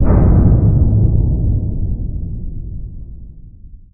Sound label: distant bang